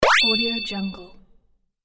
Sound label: pick coin; astralaudio